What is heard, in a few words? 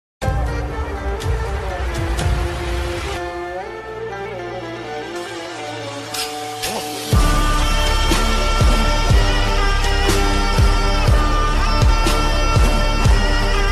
instrumental ringtone lofi; toons